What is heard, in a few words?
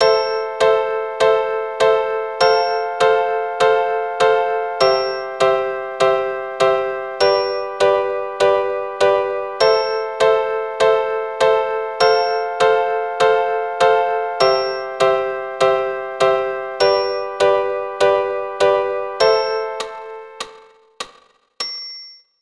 Am F C G, keyboard